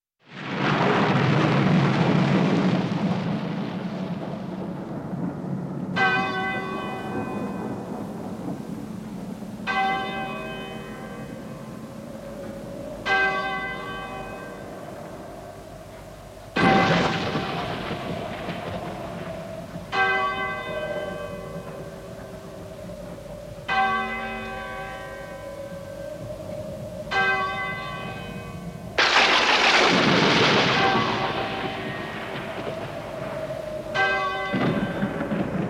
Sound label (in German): mitternachtsglocke; Horrorgeraeusche